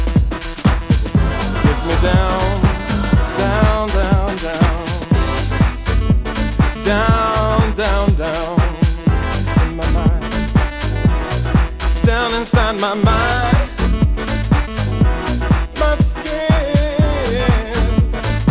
swing

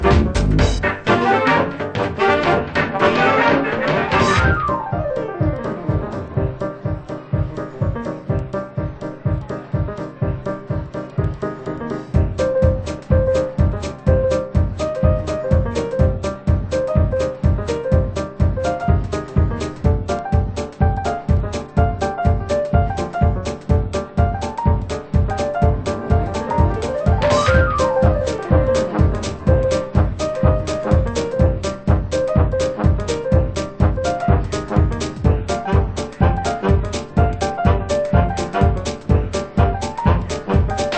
swing

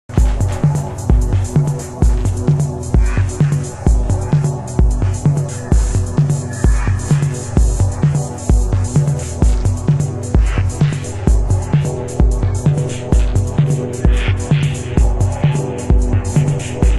echo

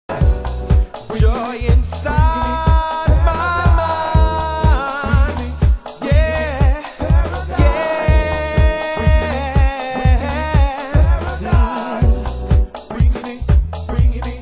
alto

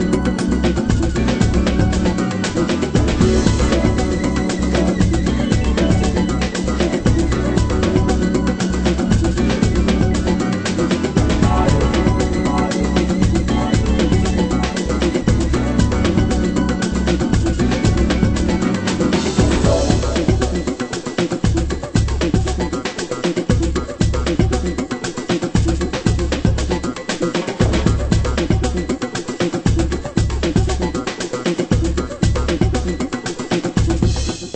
funky

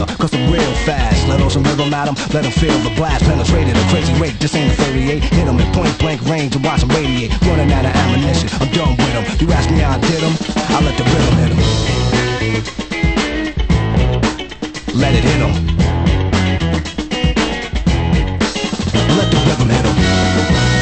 funk

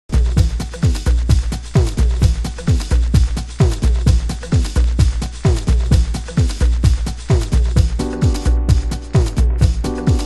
trance

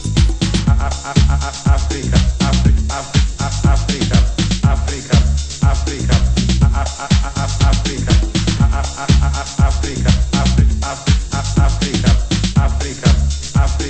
tribal

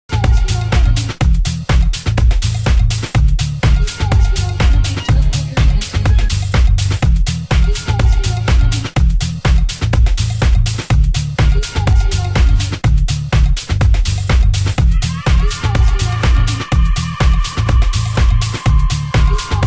swing

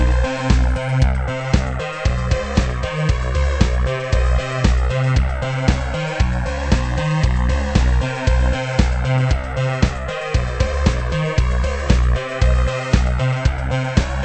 moog

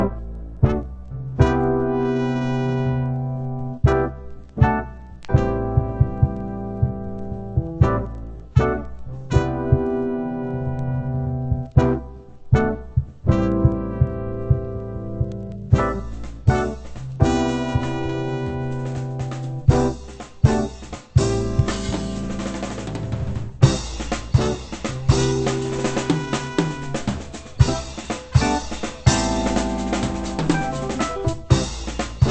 techno

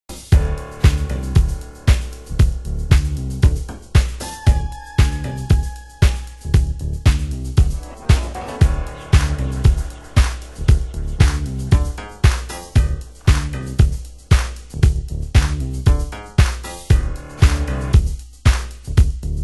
disco

